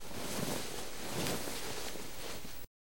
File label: Cloth